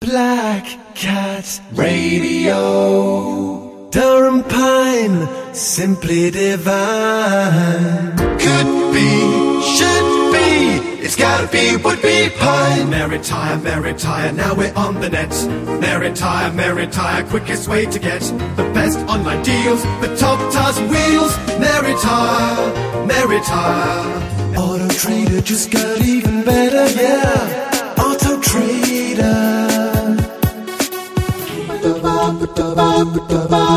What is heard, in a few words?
Jingle Singing